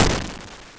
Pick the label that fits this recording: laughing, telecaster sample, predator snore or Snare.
Snare